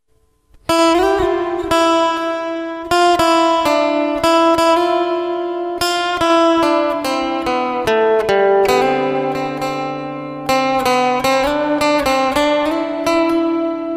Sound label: rabindra sangeet instrumental music guitar